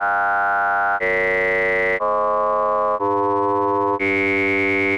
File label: tremos vokal formanter